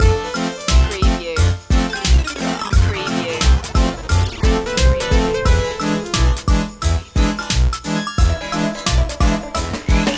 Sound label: retro video game kazoo world